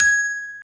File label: Bell G#6